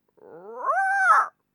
Dino

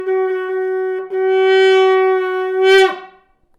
Blowing of horn

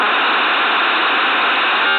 Modem

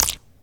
Slime Jump

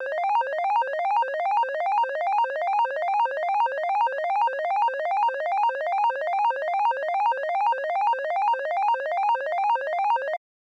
Slot Machine Phrygian

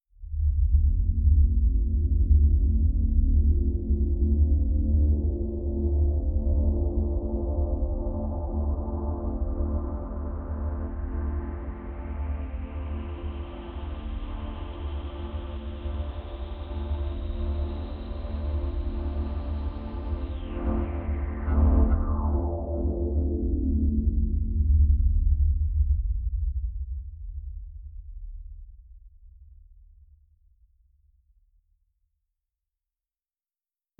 Serious Minded Subsonic Resonating Fade

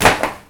Book Dropping

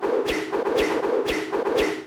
Rope Loop

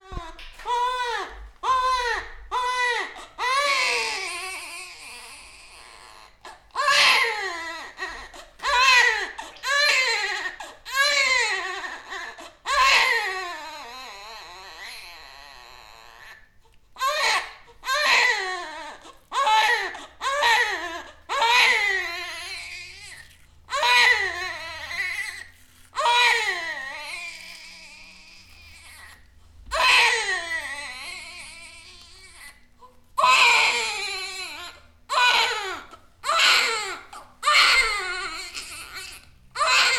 Crying Newborn Baby Child

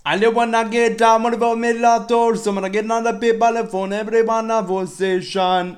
People

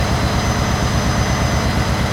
Sonic Ferry Engine Loop

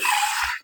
Breaks

